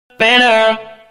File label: vault hit stinger; sinners